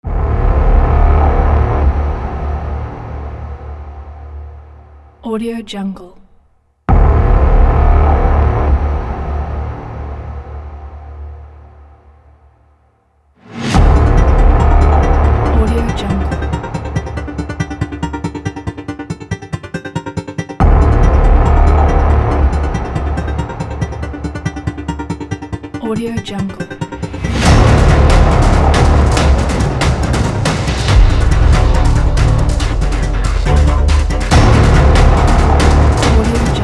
modern hybrid trailer

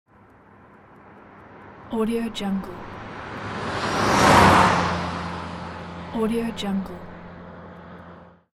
car passes by

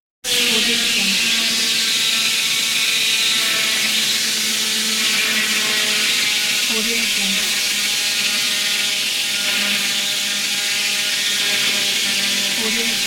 lumber mill

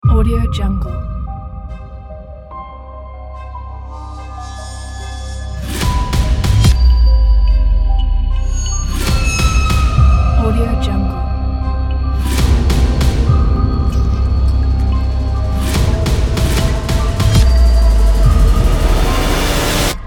the horror music

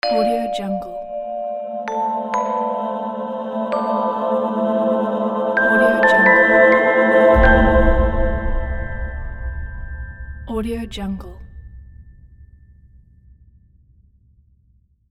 mallets logo

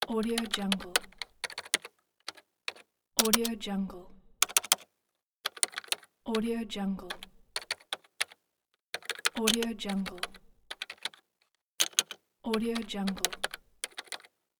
keyboard typing; arialiciyan

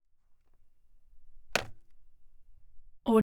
cordless phone pick up set down on wood

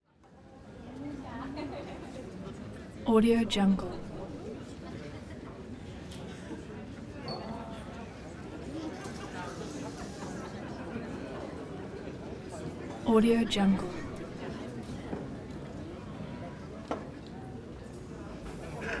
club mumbling laughing distant cough

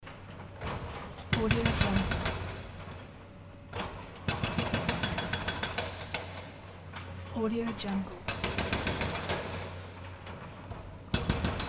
stone crushing machine

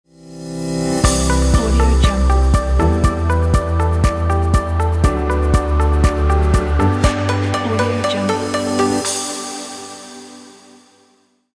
optimistic corporate logo intro

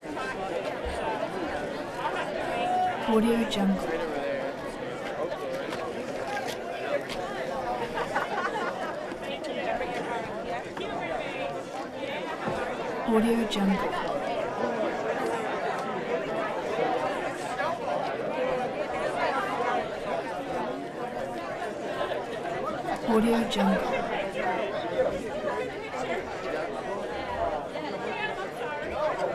outdoor party walla stereo; collanderson

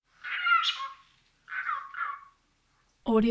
cockatiel bird interior chirping

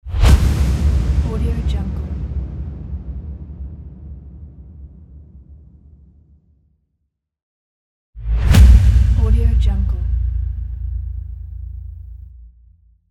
impact whoosh transition

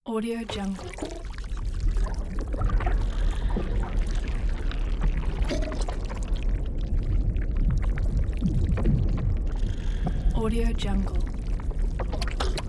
monster ambience

